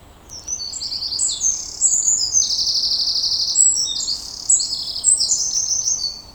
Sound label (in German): zaunkoenig